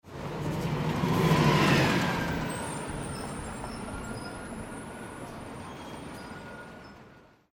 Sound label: bus station; افکت صوتی